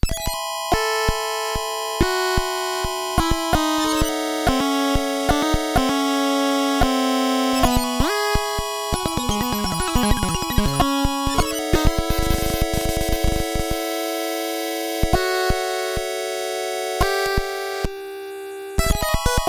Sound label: White Noise; hardcore lsdj